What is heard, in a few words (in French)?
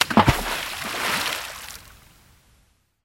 plouf caillou